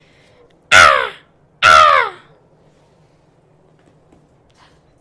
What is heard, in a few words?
crow; lecta